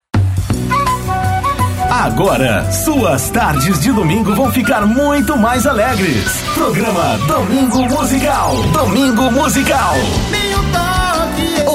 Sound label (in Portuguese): vinheta programa domingo musical; mzstuddio jvc